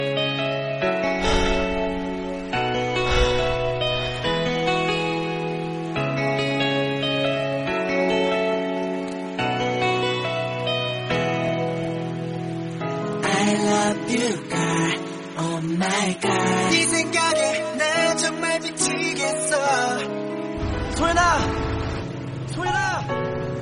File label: girl iphone ringtone